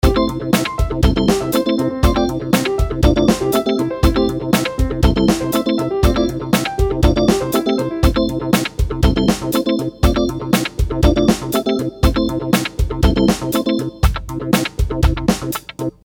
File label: Beat Arpeggio Piano Organ Drums Loop